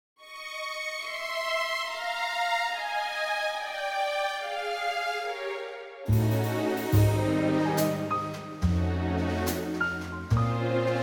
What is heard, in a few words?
Ab orchestra; orchestral